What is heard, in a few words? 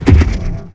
shield hit; weapons; Stellaris